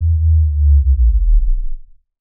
Cinematic Subbass

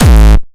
Bass Drum Namblam

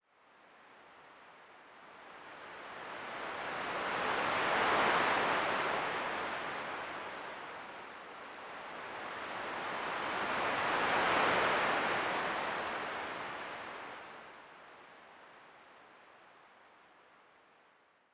Waves Ocean

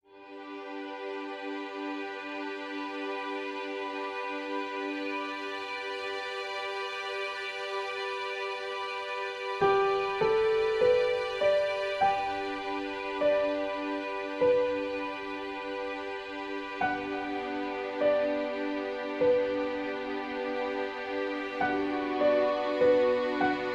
Romantic Intro

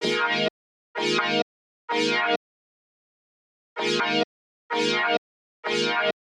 Retro Synth

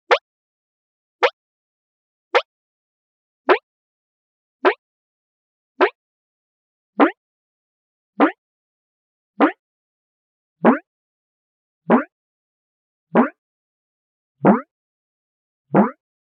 Plastic Flexing; Sandyrb

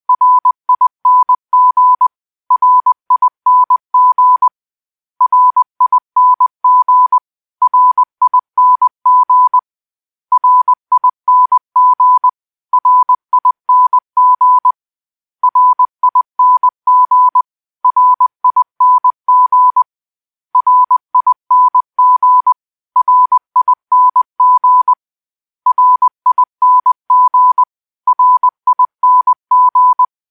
Ring Ring In Morse Code

